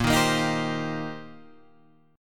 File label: C Minor 7th Bb